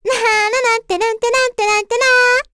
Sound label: Vox Hum; Cecilia; kings raid; en